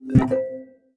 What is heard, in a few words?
chat message